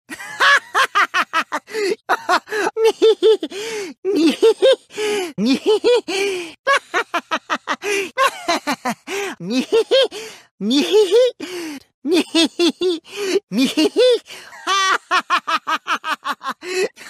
laugh ringtone; kokichi

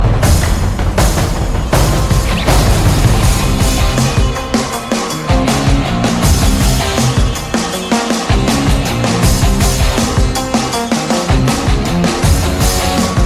rock style ringtone

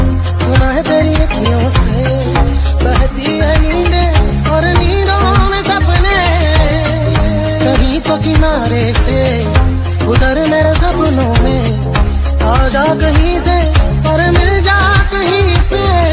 bollywood music ringtone

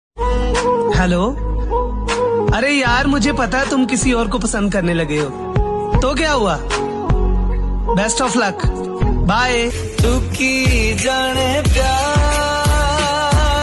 top bollywood music ringtone

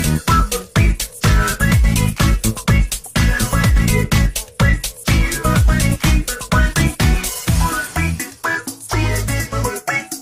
nokia ringtone; starsign

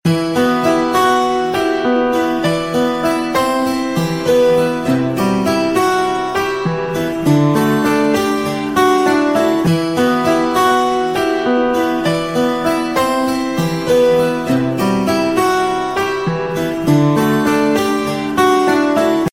piano ringtone

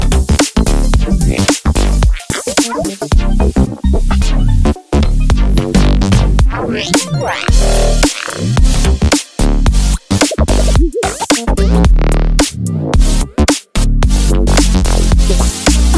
sweet funny ringtone; funny ringtones

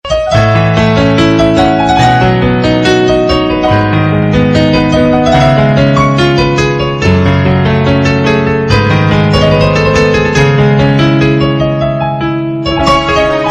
piano melody ringtone; bollywood

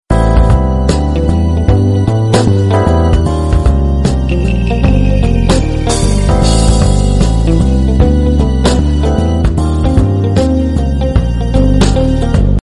best flight jazz musical tone ringtone

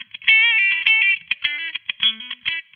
lg velvet 5g sms ringtone

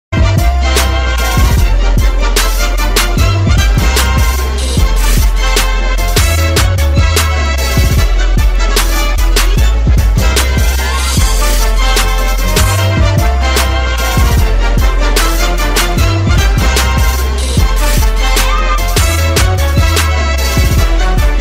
cartoon music ringtone

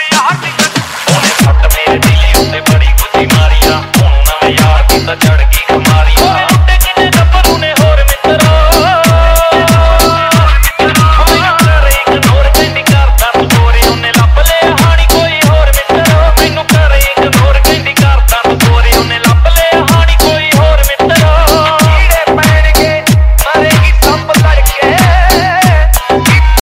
dj remix ringtone

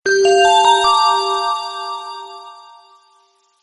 nice and cool message tone ringtone; sms ringtones

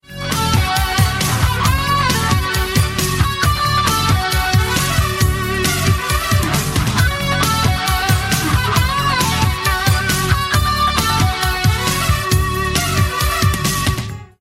indian classic ringtone

